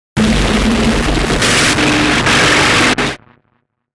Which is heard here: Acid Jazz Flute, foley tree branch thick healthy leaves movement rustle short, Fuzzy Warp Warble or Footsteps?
Fuzzy Warp Warble